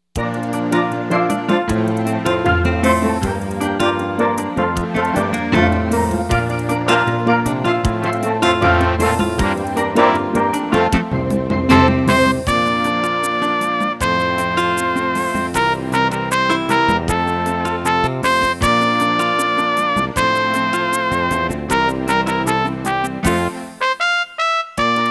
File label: Keyboard Prep Intro